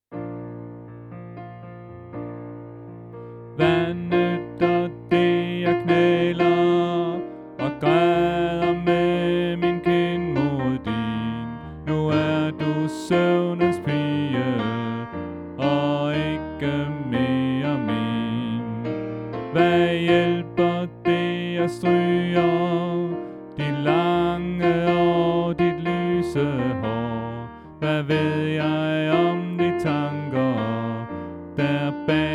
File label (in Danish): Sopran; oevefiler